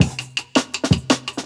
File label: 10 Pin Beat; 90s Sample CDs; Original Jungle Breaks